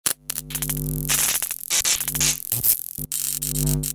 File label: wires sparking